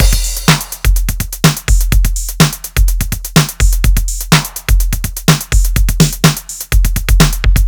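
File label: 125 DRUM LOOPS FULL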